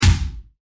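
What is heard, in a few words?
DEEP KICK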